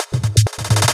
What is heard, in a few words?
Fills 128BPM, Clubsounds